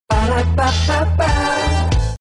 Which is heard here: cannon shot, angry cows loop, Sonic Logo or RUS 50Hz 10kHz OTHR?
Sonic Logo